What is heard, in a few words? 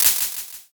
clap